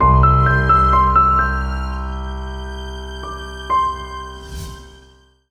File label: Cinema logo